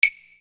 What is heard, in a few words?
Percussion; Sonic Foundry (Sony Creative Software); Infinite Soul VOL-1